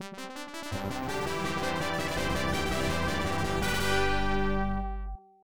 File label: Bonus Stage Fanfare; asterix arcade; Are You Ready (Bonus Stage-Fanfare)